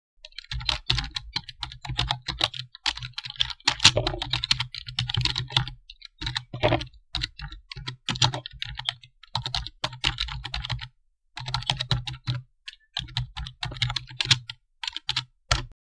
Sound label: Keyboard